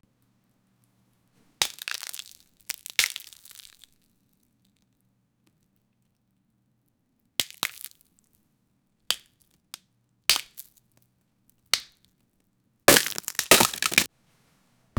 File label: realistic sound of a wate, fruits